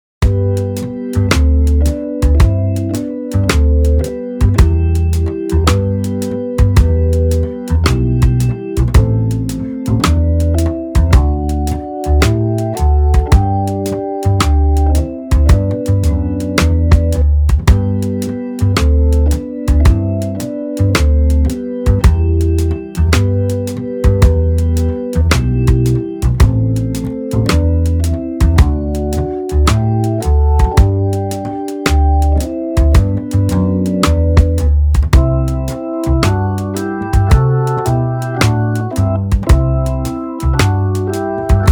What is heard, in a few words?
blues, 110 bpm